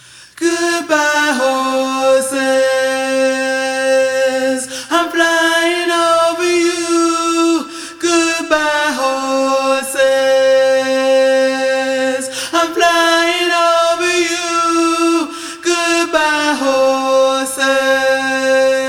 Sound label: Acapella